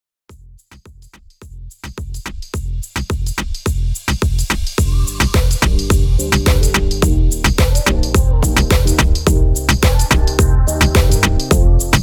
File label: TWERK 107 BPM